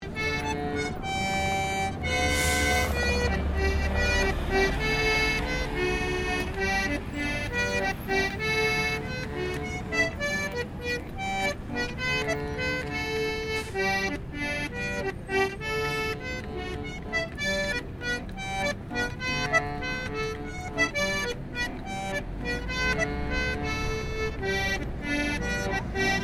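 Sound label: Ambience Melbourne Busker Accordion; Melbourne Buskers; Concert Sound Effects; Concerts (Sound Effects:Backgrounds); Foundation1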